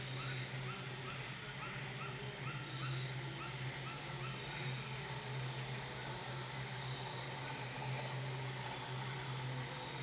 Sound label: swamp, ambience